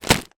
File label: food mixed nuts bag put down; zapsplat